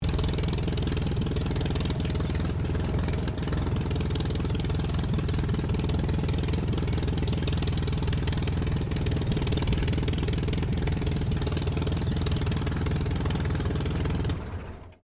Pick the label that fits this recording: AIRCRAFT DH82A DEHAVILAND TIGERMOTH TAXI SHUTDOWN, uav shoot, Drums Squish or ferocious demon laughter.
AIRCRAFT DH82A DEHAVILAND TIGERMOTH TAXI SHUTDOWN